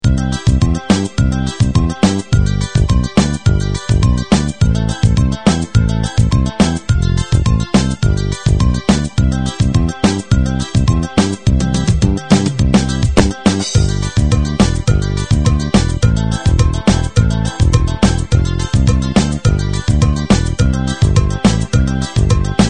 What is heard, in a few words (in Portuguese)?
forro